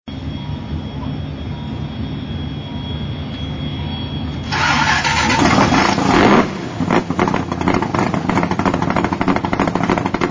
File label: dodge viper